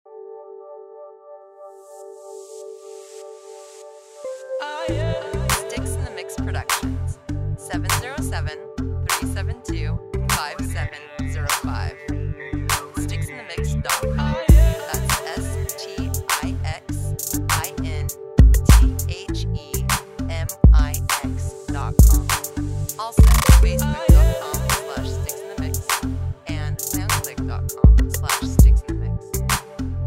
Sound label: Rain